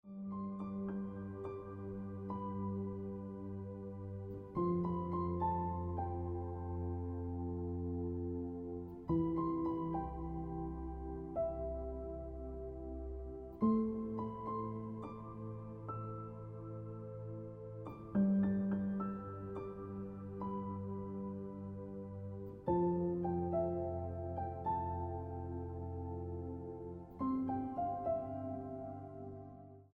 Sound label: Piano Music